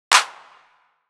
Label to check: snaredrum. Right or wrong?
Right